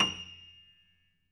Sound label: piano; East West; The Ultimate Piano Collection